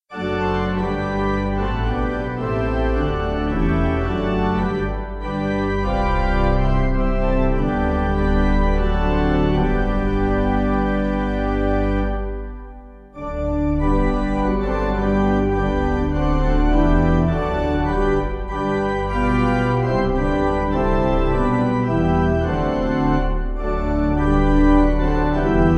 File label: Pipe